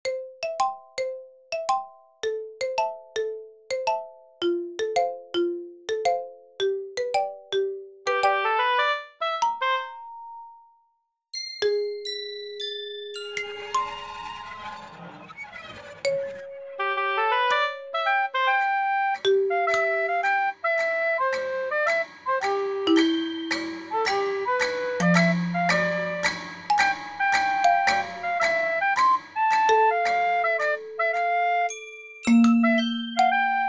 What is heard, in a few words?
Ghost